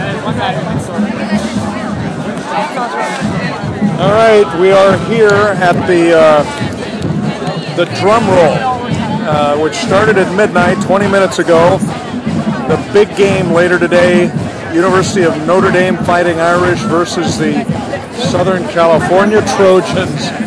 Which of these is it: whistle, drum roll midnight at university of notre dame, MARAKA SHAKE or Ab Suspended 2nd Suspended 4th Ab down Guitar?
drum roll midnight at university of notre dame